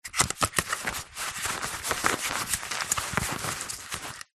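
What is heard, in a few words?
headphones handling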